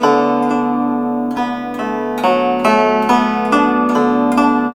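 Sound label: guzheng; Spectrasonics; Heart of Asia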